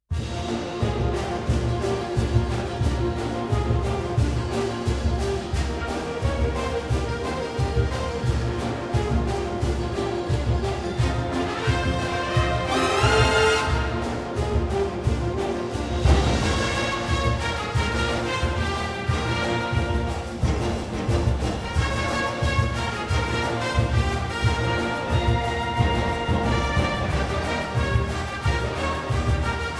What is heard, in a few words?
rocknroll